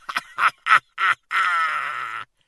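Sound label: pirate laugh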